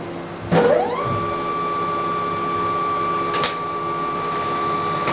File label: LASER